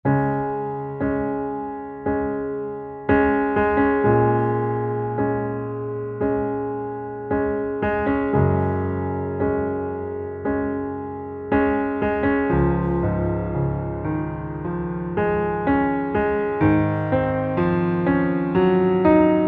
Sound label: Underscore PIANO; Worship Flow